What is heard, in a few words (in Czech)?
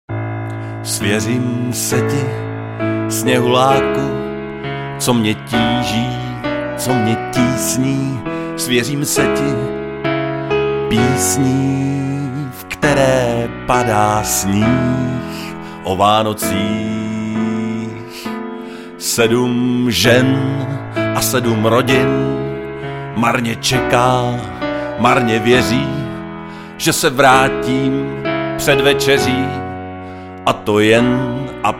klavir